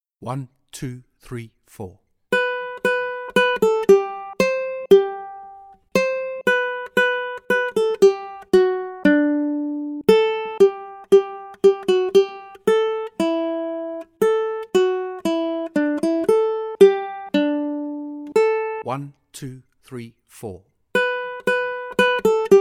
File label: Accompaniment, ukulele; grade1